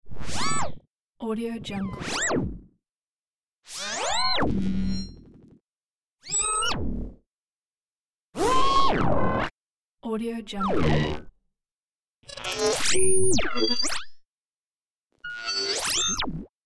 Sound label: scifi device sounds